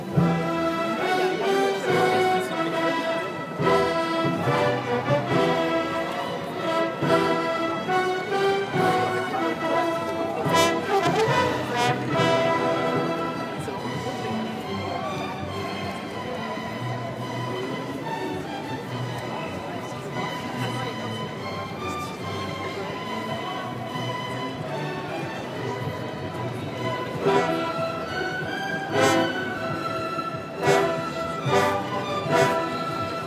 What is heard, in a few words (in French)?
orchestre debout